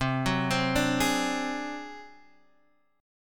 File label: Ab 7th Flat 5th C, chord, guitar